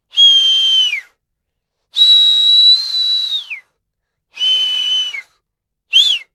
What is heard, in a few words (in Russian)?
gromkij svist